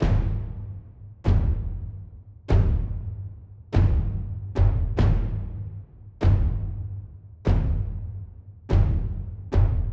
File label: Hits fast; raji an ancient epic